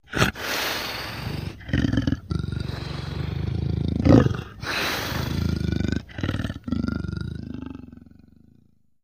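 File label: Leopard Snarl Low Growl; big cats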